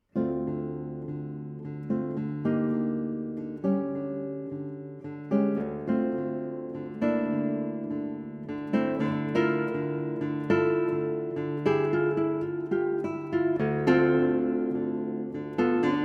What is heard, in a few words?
Guitar Solo